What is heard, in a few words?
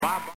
barker